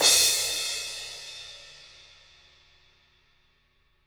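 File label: 16 crash fff, jazz crashes, Living Drums; Sampleheads; Peter Erskine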